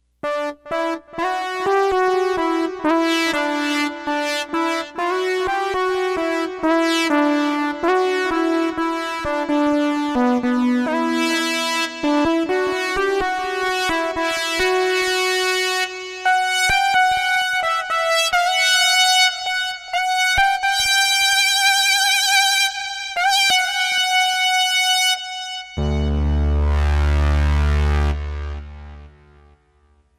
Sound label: multimoog lead